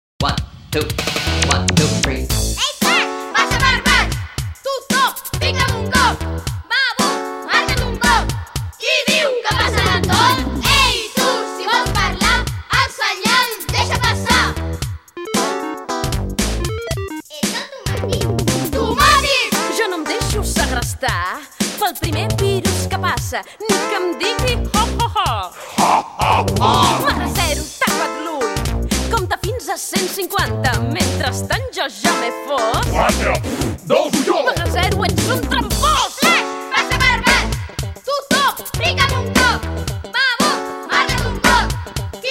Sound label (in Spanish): Rap